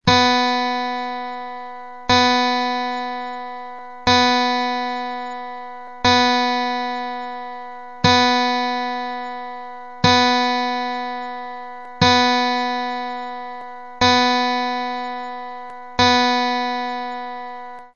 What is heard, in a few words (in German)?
5 Stimmen H Stahlsaite